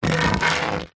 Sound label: foley wood pole stick scrape drag short on concrete; zapsplat